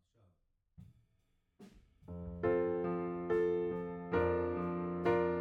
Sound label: Piano B